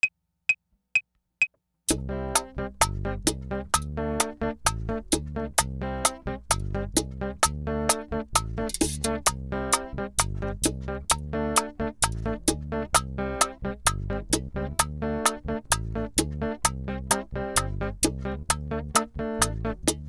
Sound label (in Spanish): TREMOLO